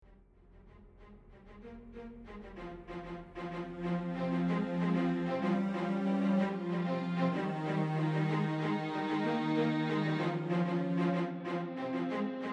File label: action classique